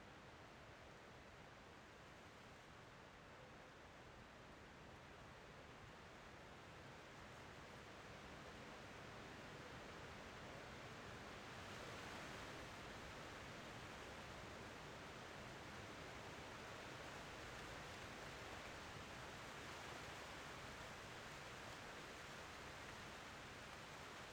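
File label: ambience forest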